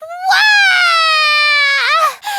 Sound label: wahhh!; kotoko